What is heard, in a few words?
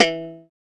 BASSES, Roland